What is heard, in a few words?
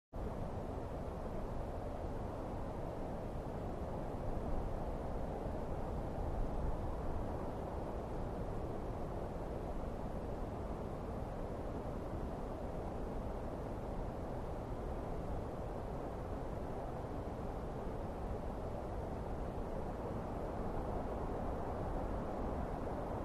Cold Mountain Night Wind